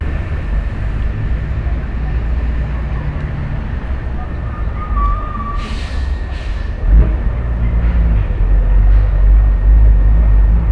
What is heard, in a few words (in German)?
Droehnende Stadt; Brasilien; Fieldrecordings